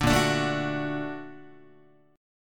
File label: Bb Augmented Major 7th Bb, Guitar; Bb+M7 strummed; JGuitar